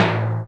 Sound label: Tune, SNES; Final Fantasy 4